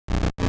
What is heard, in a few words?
growl